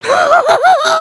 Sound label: freakout; emotes